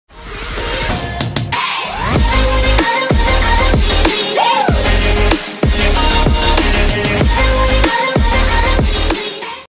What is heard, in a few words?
twitch alert sounds donation follower sub sounds